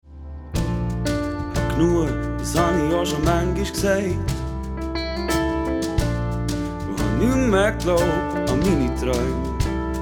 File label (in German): Duett